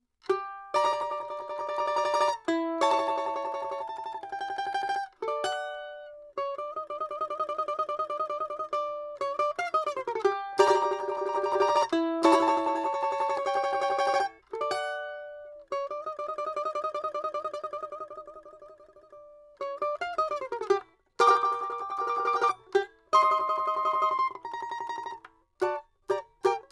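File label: domra, Neumann TLM170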